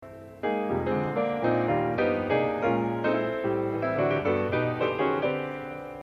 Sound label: Block Chords